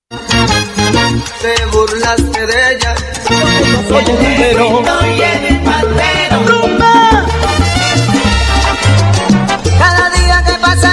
salsa colombiana

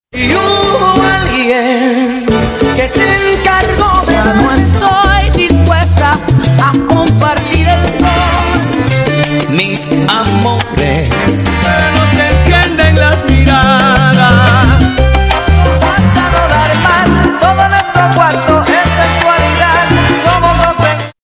salsa sensual

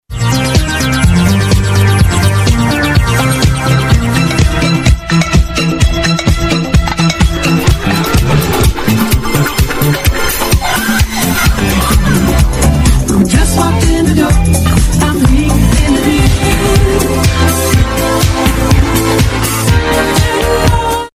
disco vinyl mix

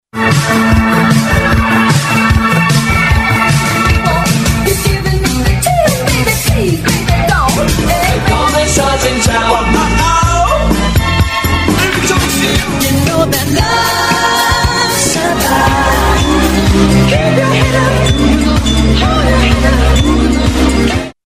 80s mix

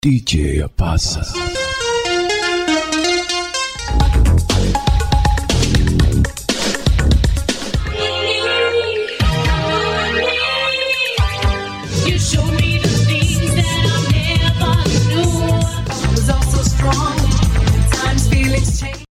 club banger

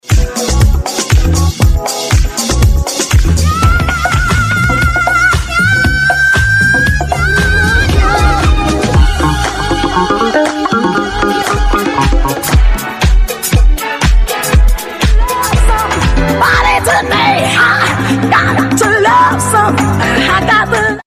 CLASSIC DANCE MIX

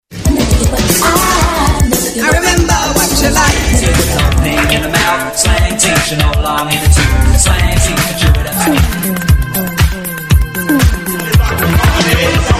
fun house

